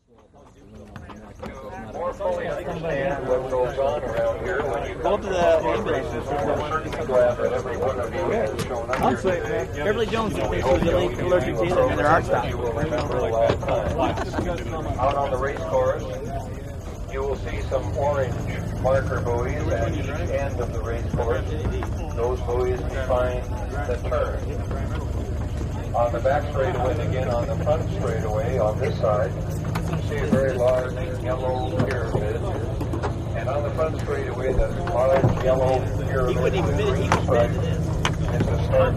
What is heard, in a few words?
Boat Race Pit Doc Amb, motorboats